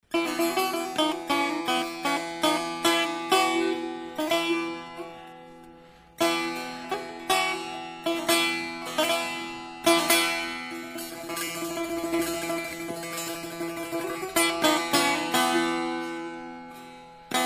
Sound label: persian setar, mulberry wood; professional instrument; Azimi